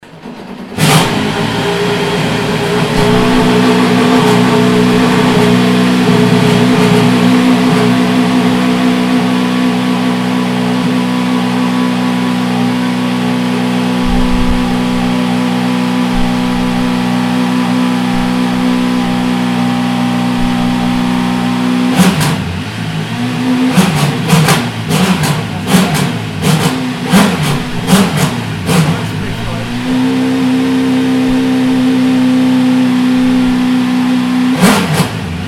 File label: Porsche 919; Porsche Soundnacht 2016